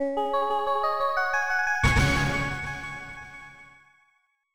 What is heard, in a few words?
Victory; Moshi Monsters Katsuma Unleashed; NDS